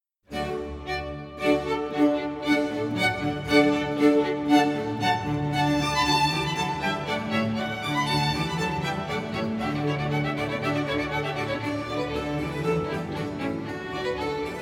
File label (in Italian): Sinfonia a quattro allegro